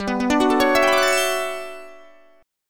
page turn

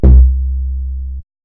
Bass

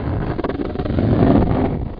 Revolving Doors; 3D Sensations 3DEEP

